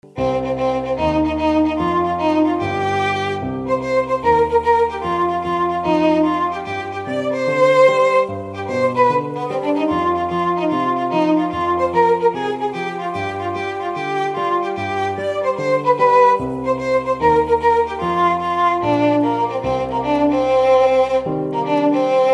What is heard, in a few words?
violon; Don Chuck Monogatari